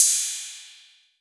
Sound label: Old Skool House Open Hat